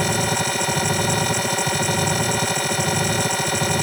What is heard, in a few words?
Sniper Rifle Alt Charge Loop; Warframe; Weapons; Corpus